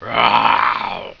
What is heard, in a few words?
roar, animal; move